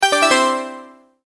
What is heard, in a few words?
multimedia tone alert